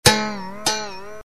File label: tune strum; dzwonki